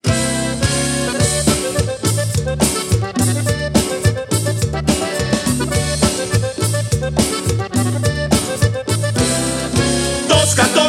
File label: JINGLE